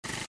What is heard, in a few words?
Aircraft Fire